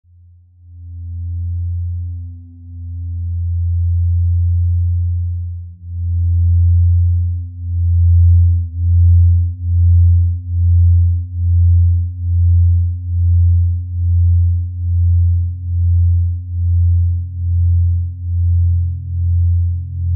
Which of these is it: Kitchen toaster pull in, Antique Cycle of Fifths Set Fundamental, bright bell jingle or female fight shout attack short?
Antique Cycle of Fifths Set Fundamental